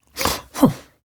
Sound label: Snorts, Grandmother, Vocalizations; Warframe; Deimos